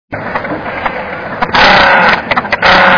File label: podiceps cristatus